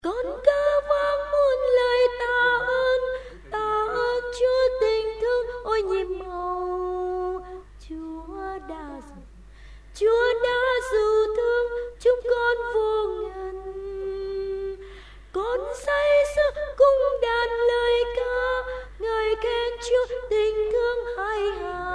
alto basso

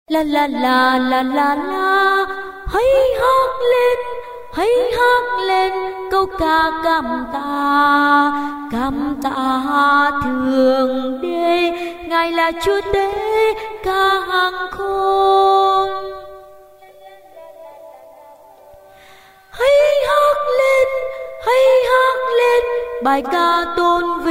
alto

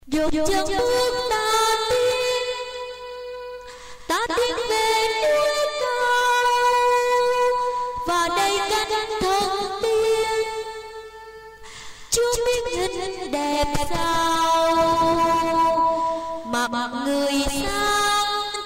sop alto

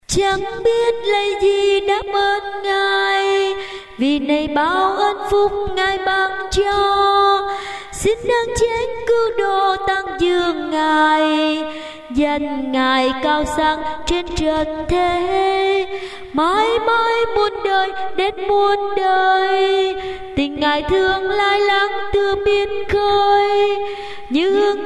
tenor basso

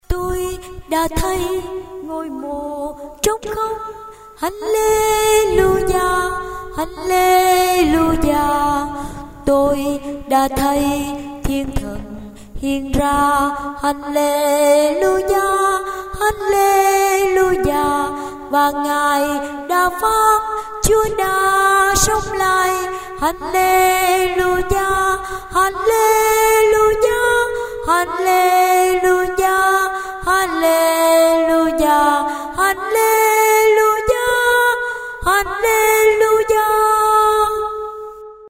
basso

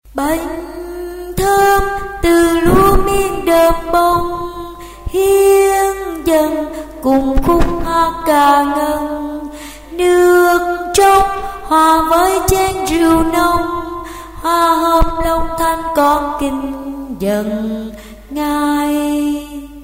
basso

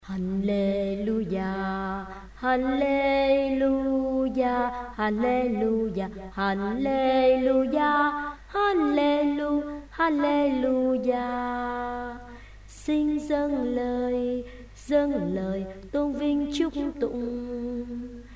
alto basso

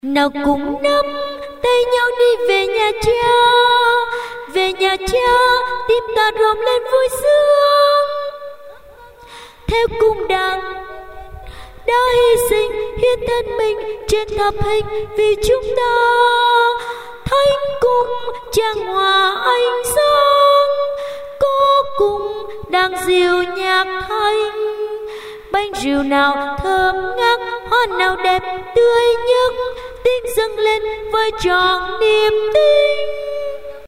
tenor basso

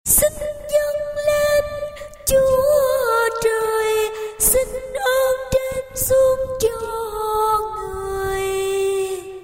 soprano

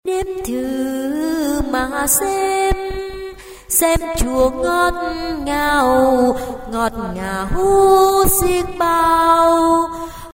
tenor basso

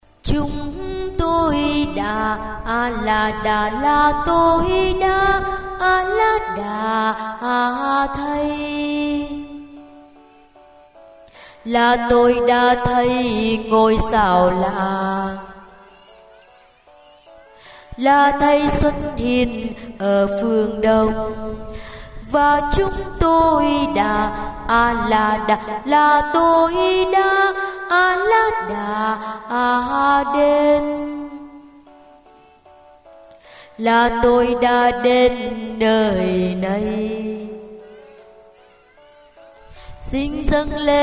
basso

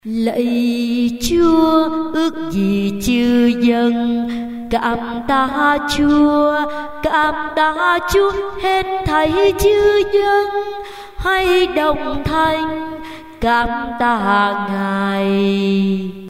alto basso

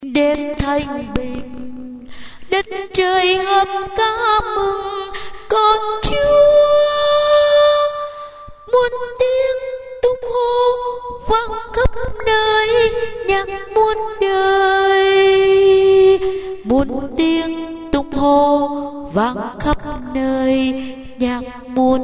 alto

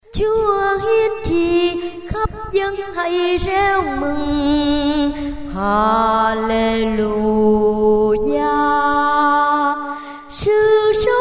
tenor basso

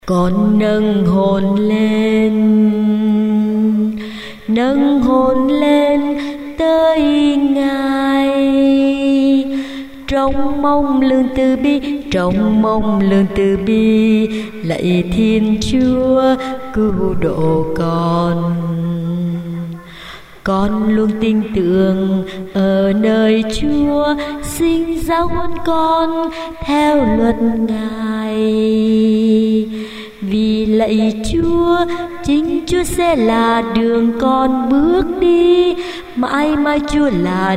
alto basso

